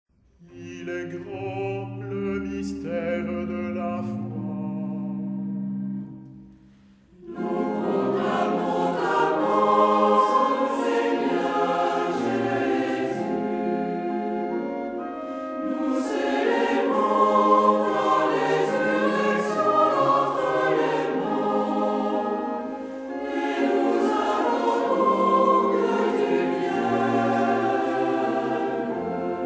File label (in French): anamnese chorale; misericorde